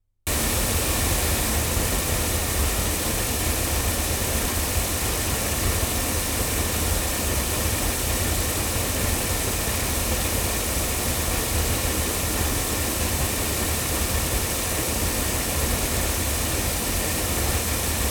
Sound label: cardioid loudspeakers; studio projects